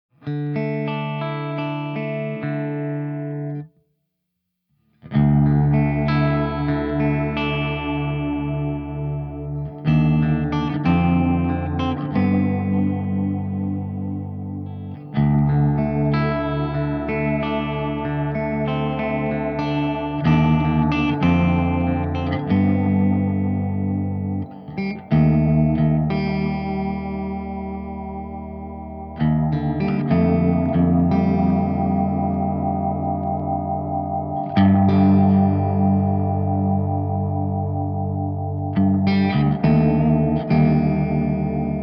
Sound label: Vintage Oil Can Echo, Medium values across the board and Both heads create a wide swimming ambience.; Dry and Wet mixed in Pro Tools; Shenandoah Avalon; Olivera